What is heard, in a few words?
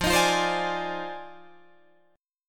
F Minor 13th, Guitar Drop D